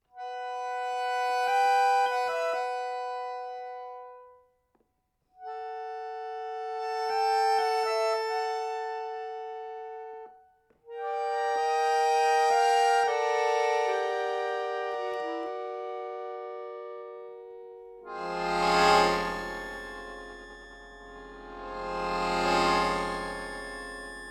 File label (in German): Akkordeon